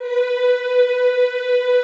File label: EEH B3, Classical Choir; Best Service; Extended Classical Choir